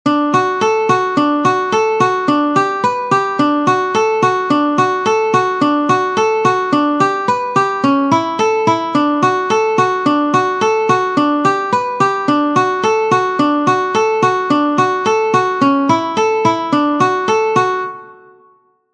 Normal Picking; Coulter's Candy

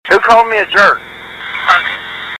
ghost box

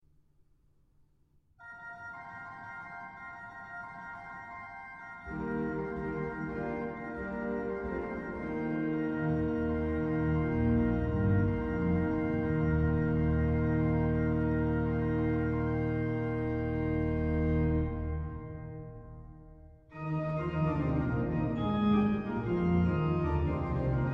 Toccata

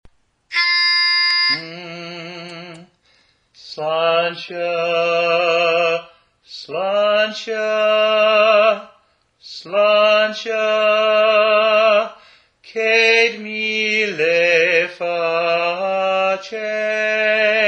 Lead

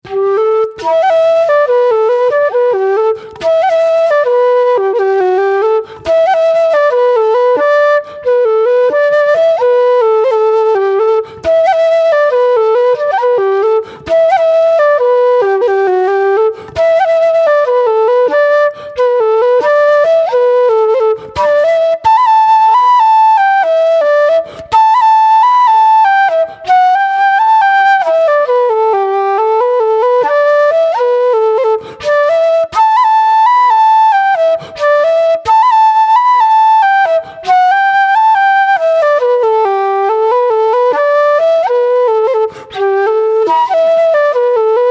New Slide Slower; OKeefes New Slide

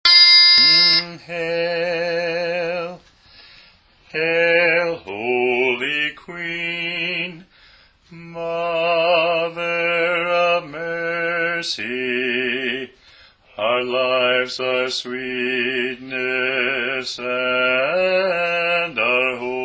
Bass; Hail, Holy Queen

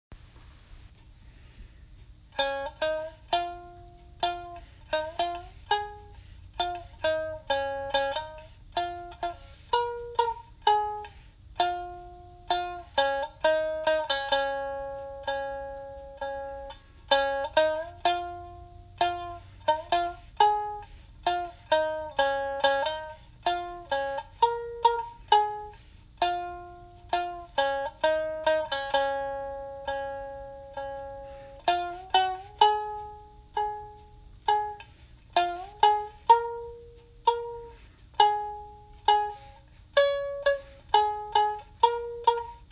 Twister Can; Demo-Hard times

